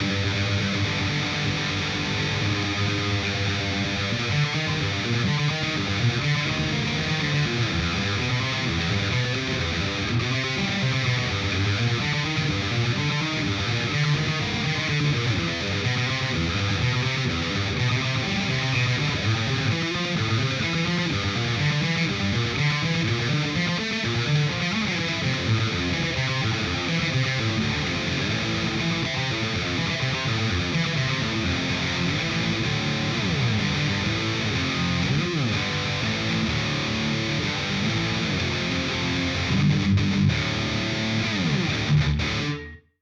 Headrush MX5

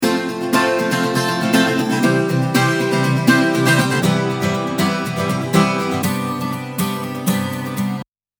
VTRC Akku

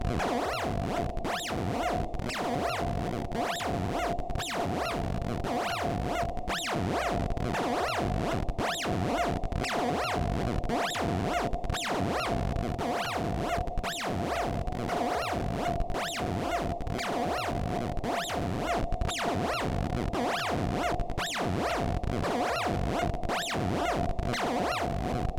Noise Seq; XILS3

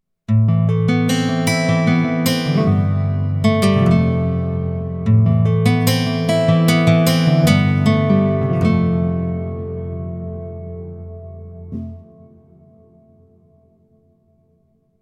Gypsy Guitar Gotic Hall

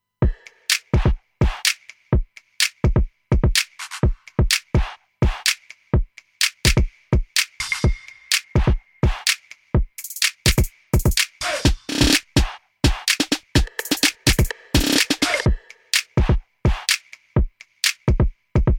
Beat Original

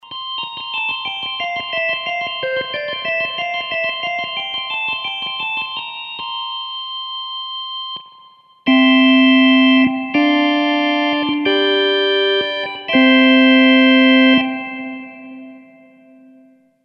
Flute

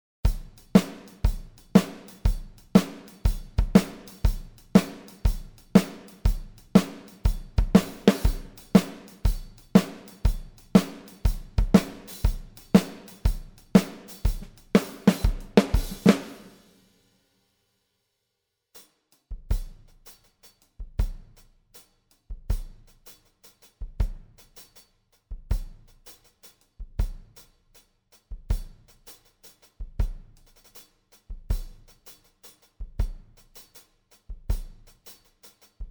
Drummer Yamaha Kit; Superior Drummer 3

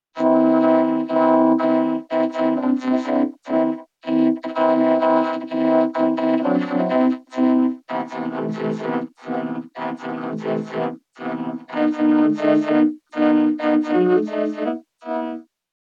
Vocoder 1 Oct Up Behringer VC340

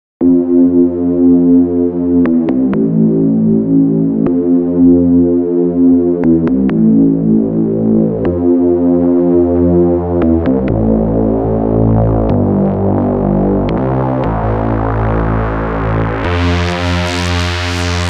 WAVES Element 2 Phase Mod Filter FM Bass

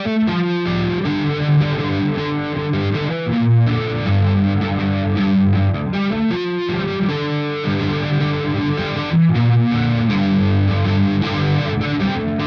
Walrus Audio Polychrome Gain Flanger

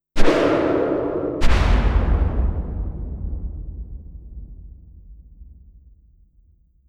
Single Detune

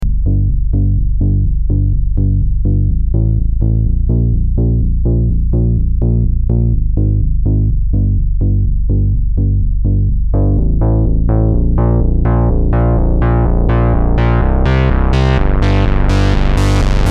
Deep Bass Sweep; Roland SE-02

